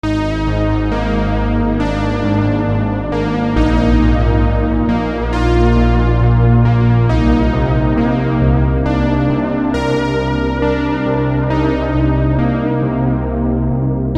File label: AGING POLY, synthesizers; AGING POLY LEGEND; redshift 6